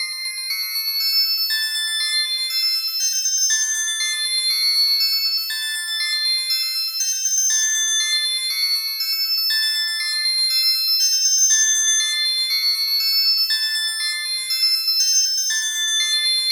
Beacon Alert

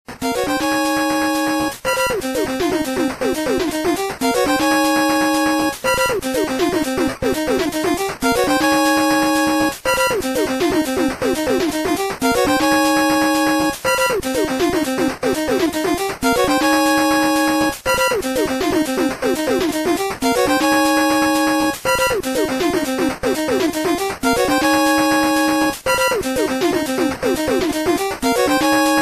Password Screen

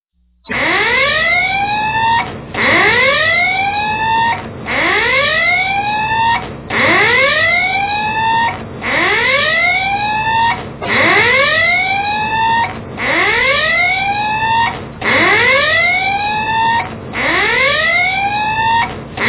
Collision

